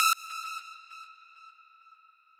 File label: lead